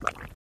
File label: Mud Blurp Quick, mudslide